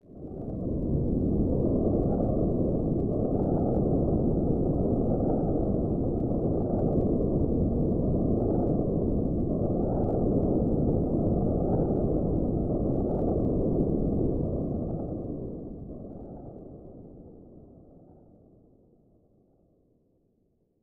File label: Wind; Madara 2